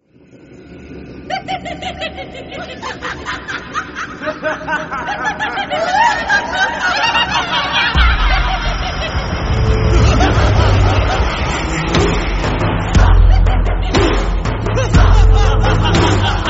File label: Entry Song Hindi; Kannappa (2025); Mundudu Clan